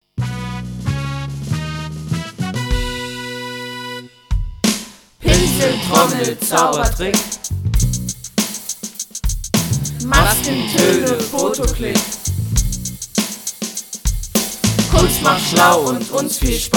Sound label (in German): Jingle; Max-Kirmsse-Schule